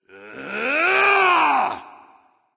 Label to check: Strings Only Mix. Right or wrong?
Wrong